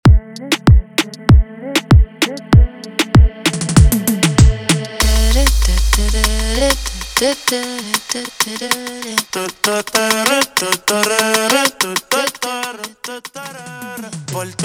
INTRO 97 BPM

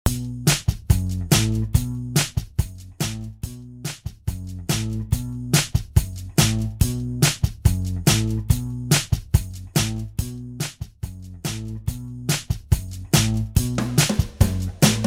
Intro Acapella 71 BPM

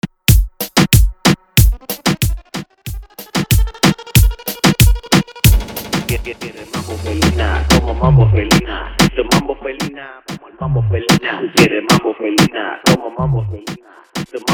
intro hype 93 bpm

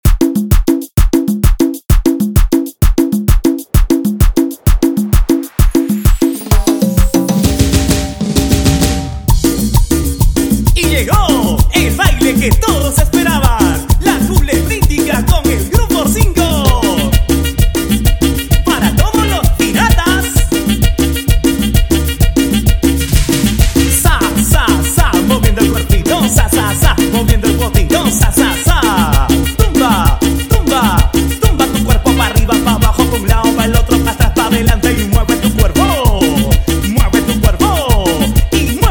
130 bpm

